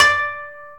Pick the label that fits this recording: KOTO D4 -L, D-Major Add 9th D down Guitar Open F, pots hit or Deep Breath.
KOTO D4 -L